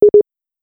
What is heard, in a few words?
hold, DTMF; Viber